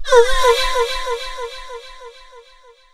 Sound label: HIGH VOX, ADLIB WOM, Funky Vocals; USB Soundscan vol.18